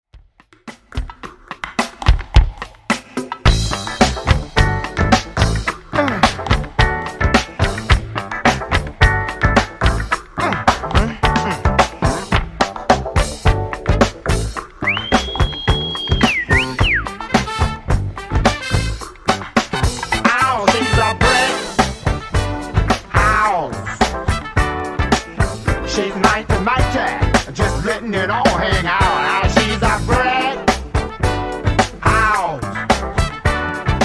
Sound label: Dj Intro Outro 108Bpm